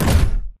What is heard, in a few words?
Footstep Jog Close; Titanfall 2